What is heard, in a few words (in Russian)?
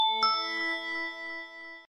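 standard second sms on Sony Xperia Z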